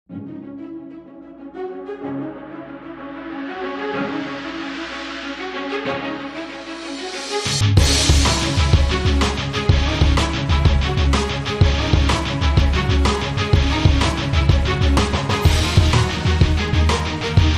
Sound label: Dramatic